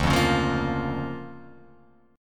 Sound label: D Minor Major 7th Sharp 5th, D, Guitar, Open F; DmM7#5 strummed; JGuitar